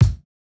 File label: kick, house